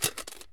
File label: drum drop; Escape from Tarkov